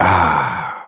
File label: "ah", Voice